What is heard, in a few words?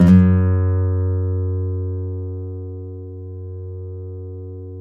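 nylon, FLAMENCO, Guitars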